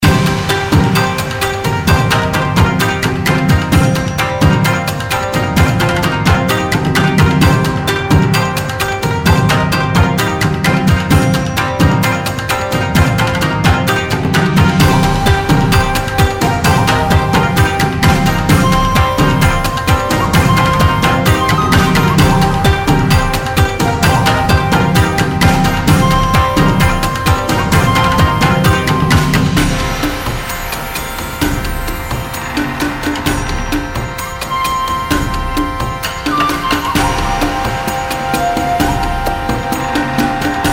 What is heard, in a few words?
Raid; Mist Train Girls